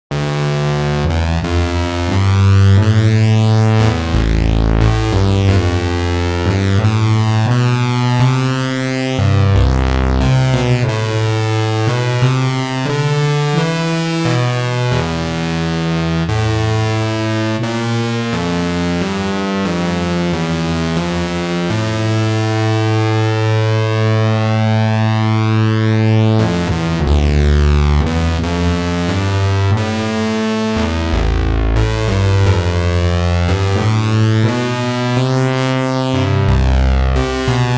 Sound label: Recorded Lead